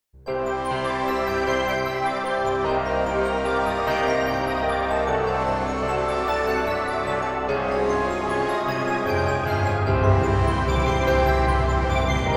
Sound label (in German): Sanctus Gesang